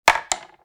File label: Chess capture piece knock; leisure